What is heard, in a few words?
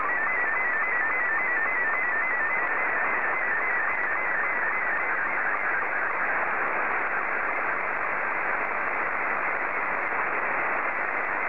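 slow-scan television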